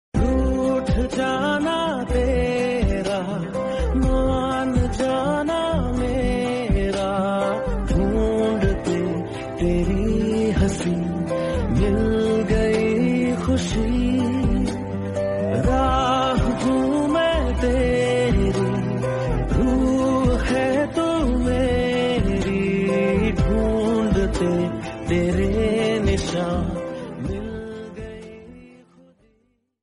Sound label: sister birthday hindi song